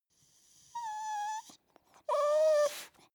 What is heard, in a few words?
dog whimper sad nerved